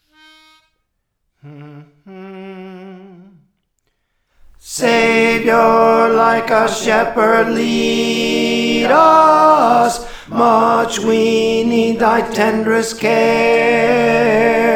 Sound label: Tenor, Praise For The Lord Training